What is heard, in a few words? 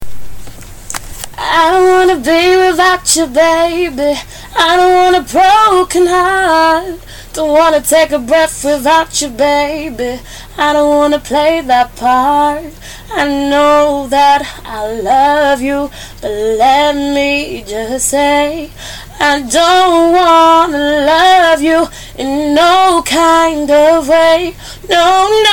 cover acapella